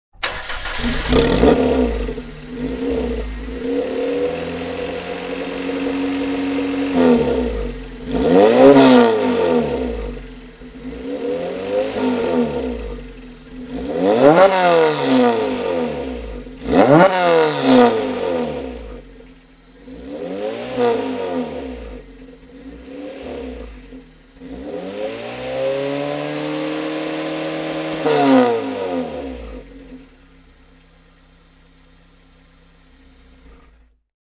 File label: Ignition Exhaust Sound